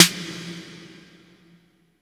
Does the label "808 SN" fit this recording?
Yes